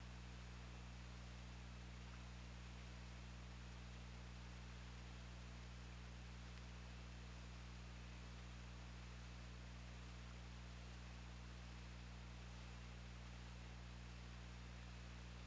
Orca; OrcaLab

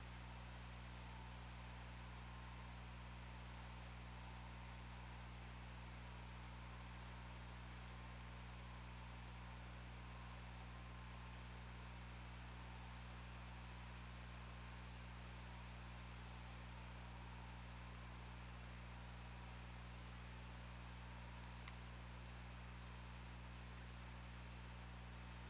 Orca; OrcaLab

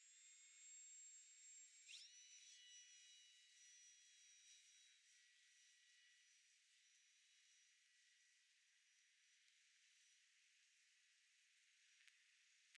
DRONES

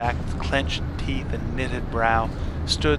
noisy

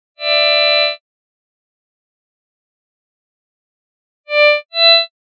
chord signal

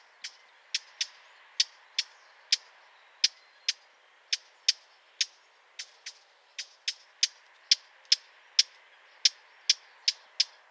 Sylvia atricapilla; SETE

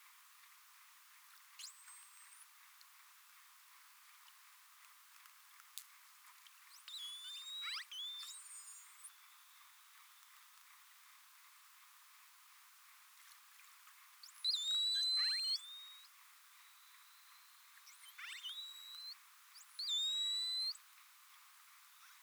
voc; ZOOM

